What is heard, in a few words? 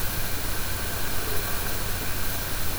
growl